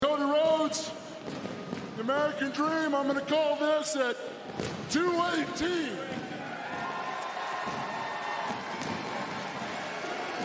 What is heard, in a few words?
fireworks